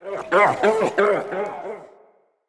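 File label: goblin voice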